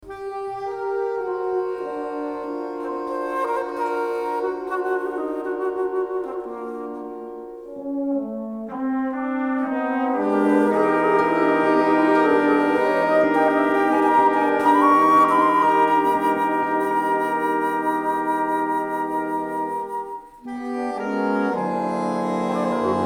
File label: Dublin Soundscape